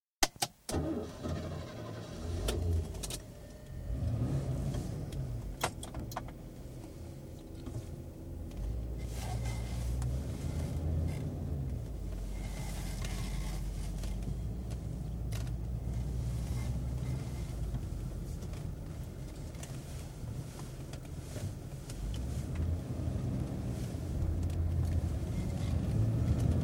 Rolls Royce Silver Spirit, interior, start up, constant run, stop; Horn sounded at 1m39s; 675 litres, 1986 model; Car; Car Sound Effects; BBC